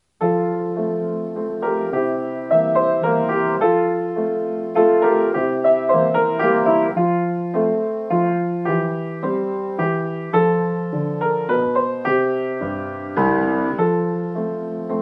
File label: Minuet in F